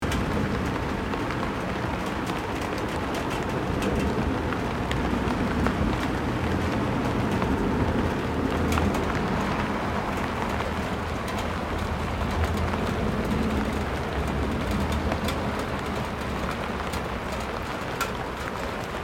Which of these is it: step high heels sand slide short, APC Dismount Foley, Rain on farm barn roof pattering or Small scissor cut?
Rain on farm barn roof pattering